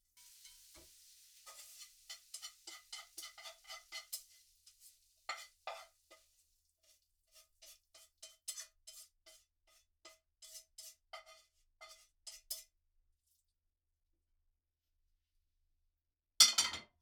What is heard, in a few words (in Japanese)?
炒め物をお皿に盛る; 3DIO; 食事に関する音